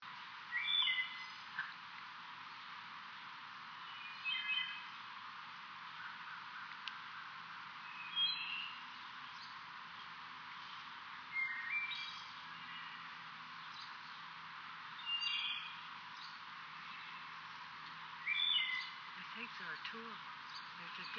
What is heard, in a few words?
thrush